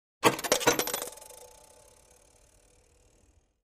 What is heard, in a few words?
Throwing a coin into a pay phone